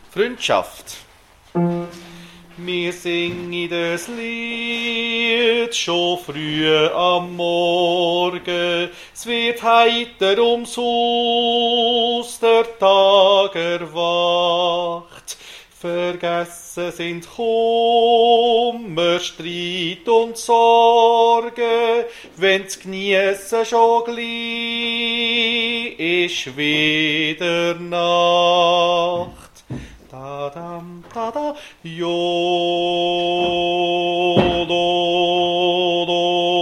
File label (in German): 1. Bass